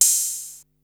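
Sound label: hi-hat open; 300 Drum Machines; Yamaha Motif ES-Series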